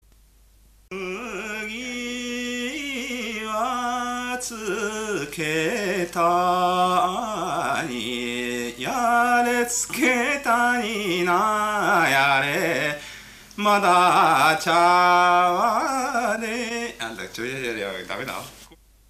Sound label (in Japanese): mugitukiuta, minyou